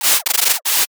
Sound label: camera bag zip